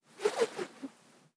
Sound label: Twirl Smol, organic wooshes; Micro Pack